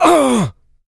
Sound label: pain large, player; DoomRTX; medium